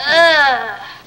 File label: ugh kid; ooh ahh; human